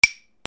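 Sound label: percussion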